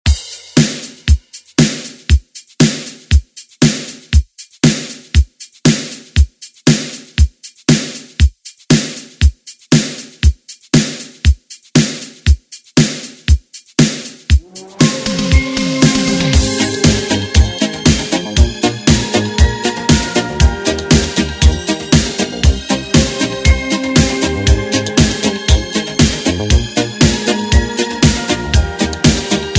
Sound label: 80s ReDrum; DJ Mhark